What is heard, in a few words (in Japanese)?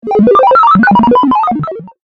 ピコピコ メカノイズ